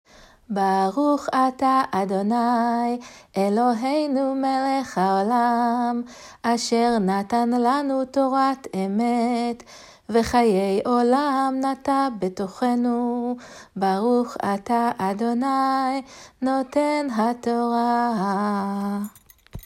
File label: blessing after reading from the torah